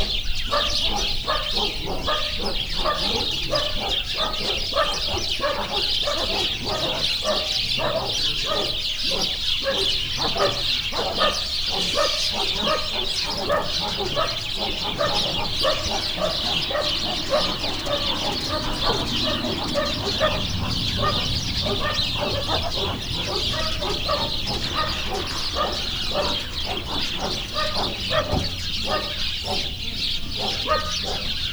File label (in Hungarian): verébcsapat kutyák autó, házi veréb; somogyszentpal; parasztudvar